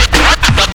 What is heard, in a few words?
scratch; Zero-G; Freak Beats